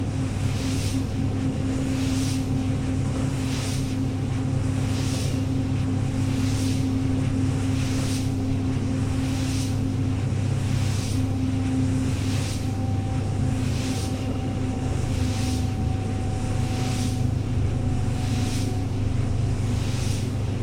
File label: good blade